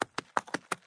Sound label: Goat Man Attack